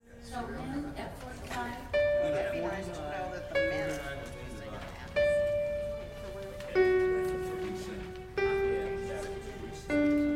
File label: men